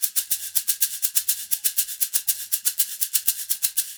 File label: 120 SHAK, Percussion Loops